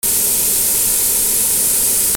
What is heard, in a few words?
INDUSTRY